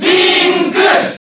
Crowd JP; Link

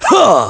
Attack; Ganondorf's basic attack shouts

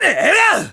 Back Slice; Link's Back Slice (roll-around attack)

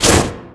Strike

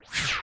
Boomerang Throw; Throwing the Boomerang; zelda; OOT

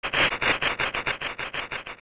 Leever Disappear

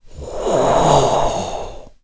Orca Ohhh

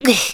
Die; Link; zelda

